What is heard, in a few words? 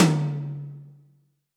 TOM, AMBIENCE TOM